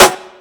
Studio Snare Sound F# Key, steel snare drum tuned to the F# note